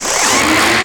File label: Driller idle; FlyForInsanity